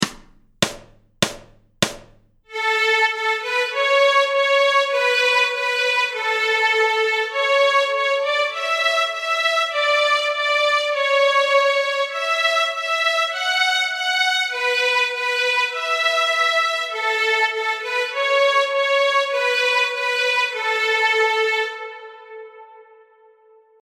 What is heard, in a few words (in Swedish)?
marsch, nyckelharpa; Tordenskiölds marsch